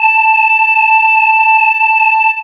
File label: SWIRL; X-Static Goldmine 1